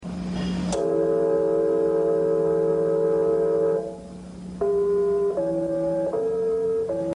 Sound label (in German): alarmgong Berliner Feuerwehr